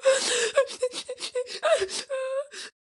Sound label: female cry